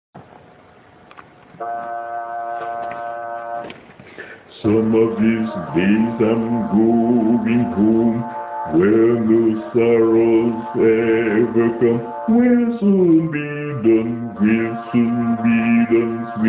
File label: bass